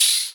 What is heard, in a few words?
Open Hihat; Vengeance Samples; VEE Cymbals